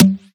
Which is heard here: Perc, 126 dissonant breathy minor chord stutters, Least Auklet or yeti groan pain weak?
Perc